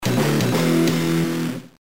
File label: level start; VIP; GBA